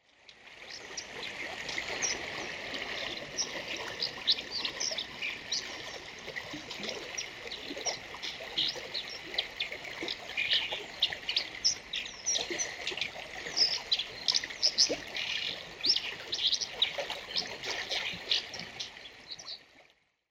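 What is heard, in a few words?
Brown Dipper; kawagarasu; nikko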